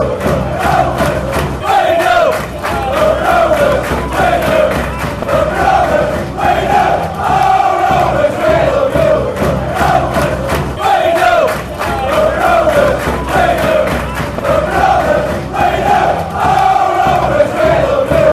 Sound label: we love you rovers; drfc